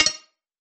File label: Clicky